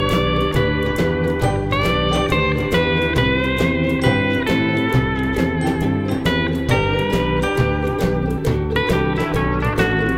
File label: Ukelele; SpongeBob's Next Big Adventures Online